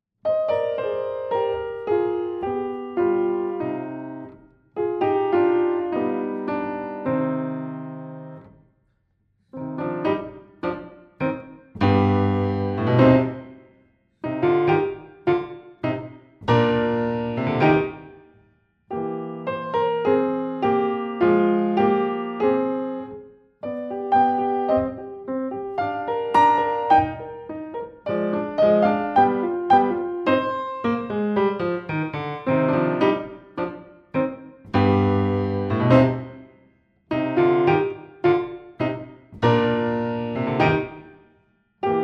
instrumentale; Ballade à la lune; Treize à la douzaine

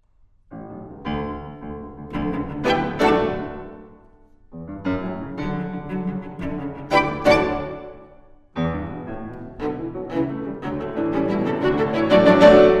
Farandole de Provence instru; Vingt chansons du patrimoine

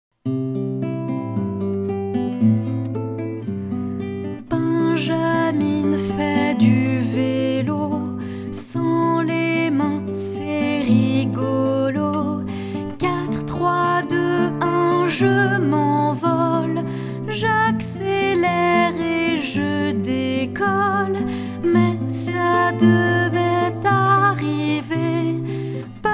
comptine

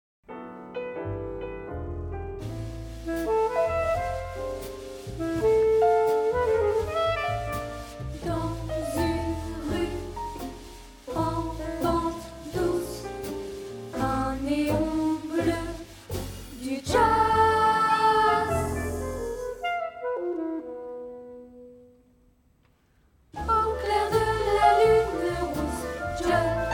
chant

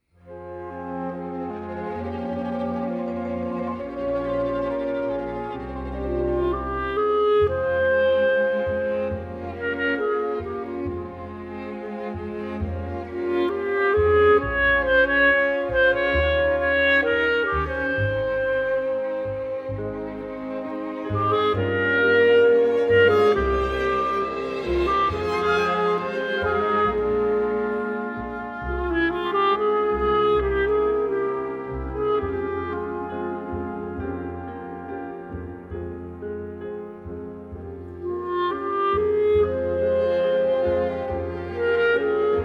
instrumental melodie

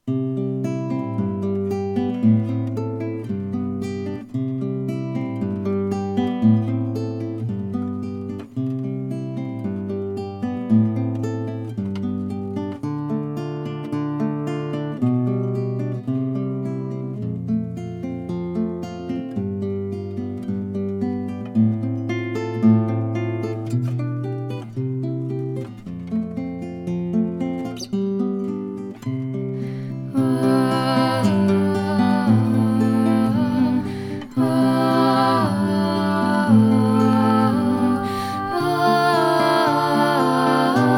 accompagnement seul

